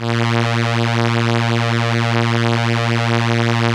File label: STR ELKA, Elka Strings, Elka Violins; Roland LCDP09 Keys of the 60s and 70s 1